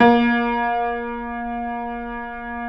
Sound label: A#2 L, Keyboards Acoustic; USB Soundscan; AKAI